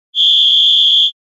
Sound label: whistle